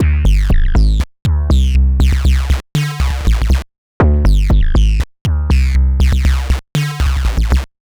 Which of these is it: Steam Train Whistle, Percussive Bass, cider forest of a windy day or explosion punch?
Percussive Bass